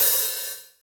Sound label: long tail reverb open hi hat f sharp key